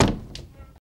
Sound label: Morgue Push Door, pushbar